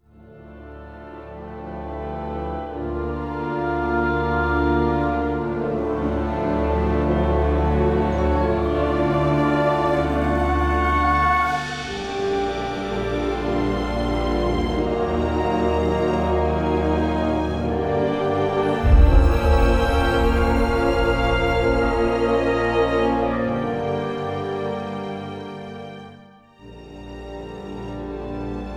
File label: castle music